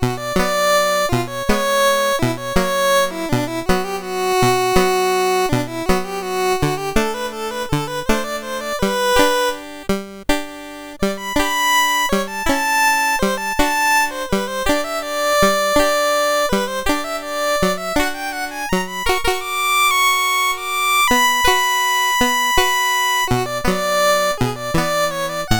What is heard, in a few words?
Barcarolle, CSG8580; Syl